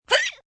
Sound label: Hiccup Squeal, Hiccup, female, short, squeal (pig-like) (human voice); Hiccupping